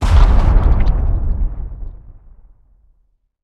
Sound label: volcano, ambience